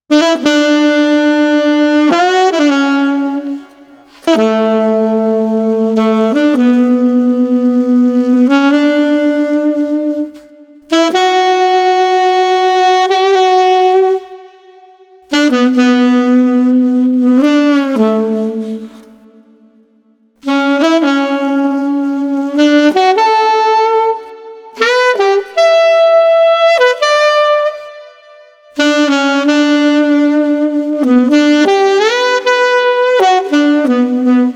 Street Baritone Sax Somber 110

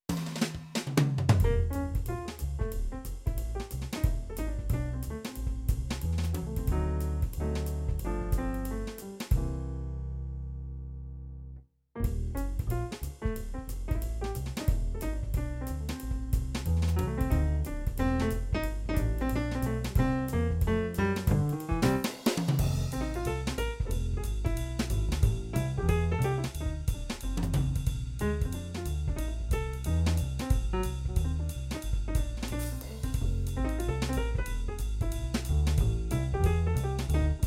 Piano Lounge

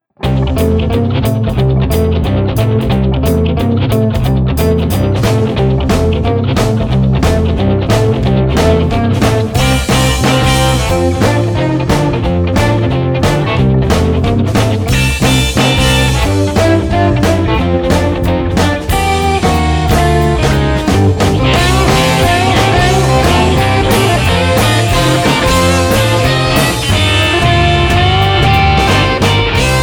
Blues Rock Jumpin 180

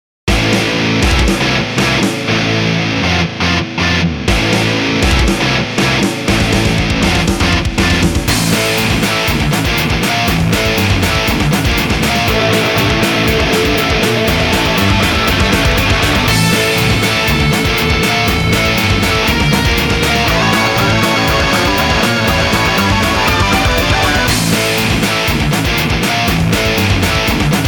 Hardcore instr

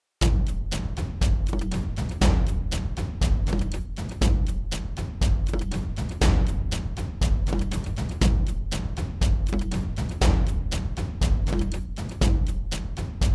Percussion Only 120

